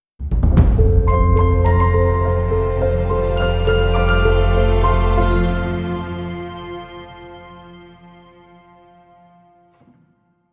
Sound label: Piano